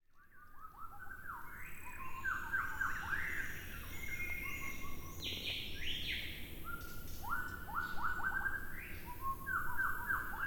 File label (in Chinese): 壽山 白腰鵲鴝